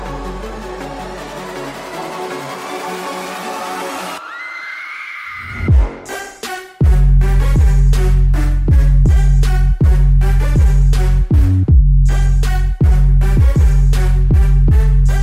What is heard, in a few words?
Trap